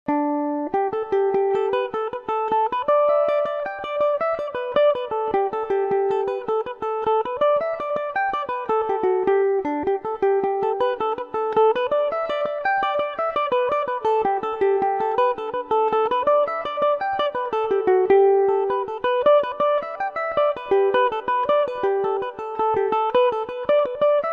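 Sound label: jig low ending version G major, Irish mandolin; kesh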